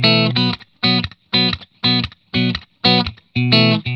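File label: 120 B, Vintage Blues Guitar rhythm; USB Soundscan vol.22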